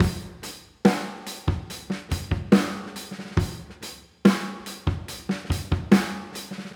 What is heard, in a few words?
Live Drum Break Wet